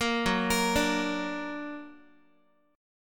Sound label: Gb Augmented Guitar; Listen to Gb+ arpeggiated